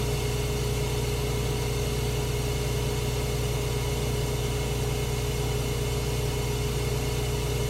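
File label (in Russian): монотонный шум работающего кондиционера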